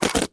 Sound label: Staged Tire Blowouts